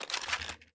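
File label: inspect movement; valorant; rgx 11z pro vandal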